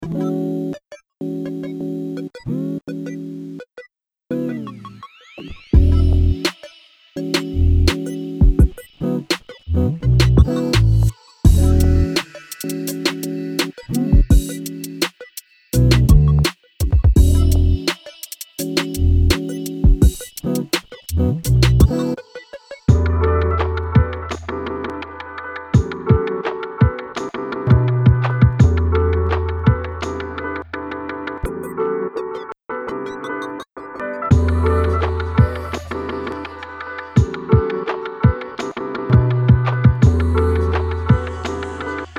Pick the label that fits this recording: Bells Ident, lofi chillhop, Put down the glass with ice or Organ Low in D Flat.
lofi chillhop